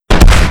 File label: jump; ff2; neo rtx 2025